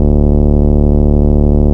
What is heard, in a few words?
Bass D, Root D